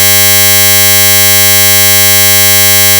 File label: 1khz tone